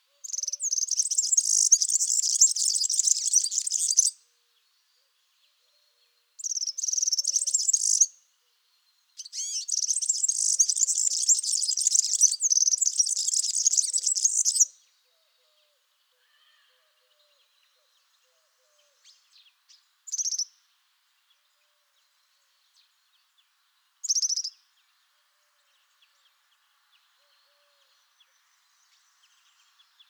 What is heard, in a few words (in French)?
serin cini cri chant